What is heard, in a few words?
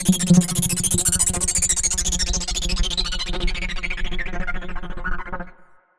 Noise, Processed Noise; hiss crackle